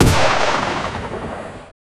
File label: man o war sailing ship ca, sea; sound effect